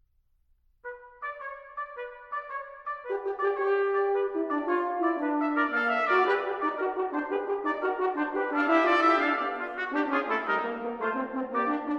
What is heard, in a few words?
Quinteto Concertante Scherzo